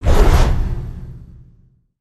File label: Teams Disband; aronwk collection; ndaudio